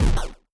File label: explosion lowbit short